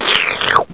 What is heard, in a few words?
slurp; halloween